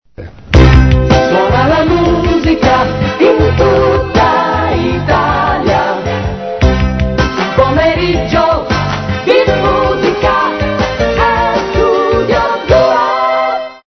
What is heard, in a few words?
rai stereo due jingle